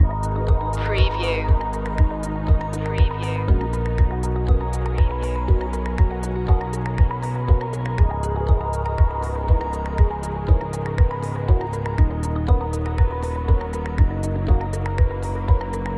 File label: aerial messages loop